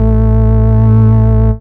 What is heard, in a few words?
sub A, techno trance bass